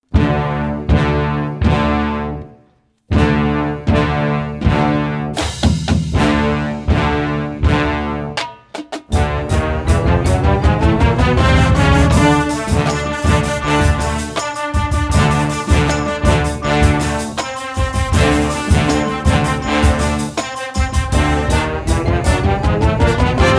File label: Marching Band; Publisher: Hal Leonard